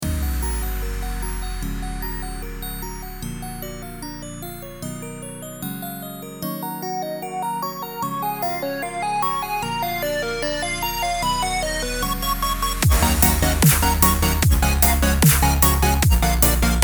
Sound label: Glitchhop